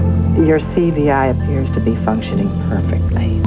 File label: flame